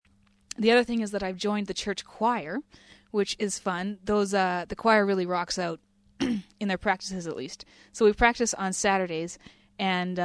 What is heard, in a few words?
choir